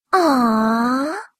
section fail; WMountain 3D Skin

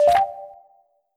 menu hit; MarkMoon

